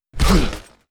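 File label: damage, monster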